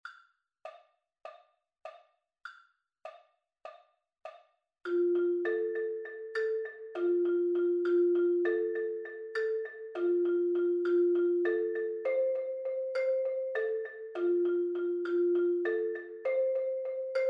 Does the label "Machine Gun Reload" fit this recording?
No